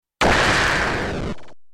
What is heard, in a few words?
laser L